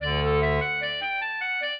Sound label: clarinet; Mozart